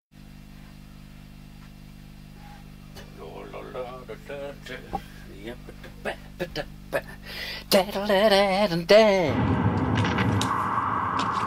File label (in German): Radio Jingle Die Musik; SooperRadio; Radio Woltersdorf